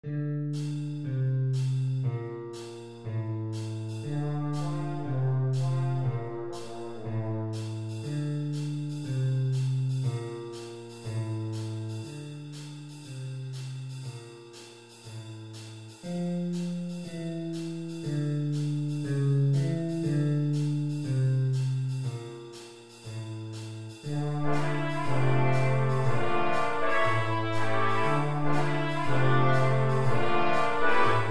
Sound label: swing